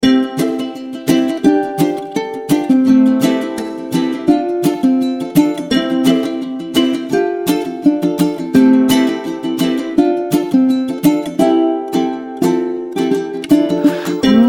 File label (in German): musik loop; tui cruises